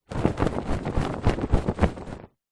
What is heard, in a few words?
household pillow case shake